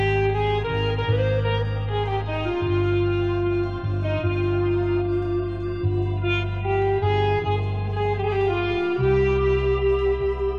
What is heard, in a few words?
harmonic